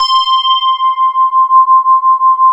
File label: PULS LEAD; MORPHEUS